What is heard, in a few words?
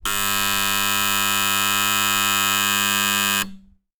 flat buzzer int dry